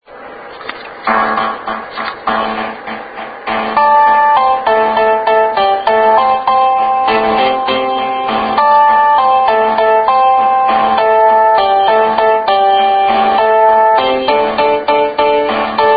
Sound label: Beer jingle; Hamms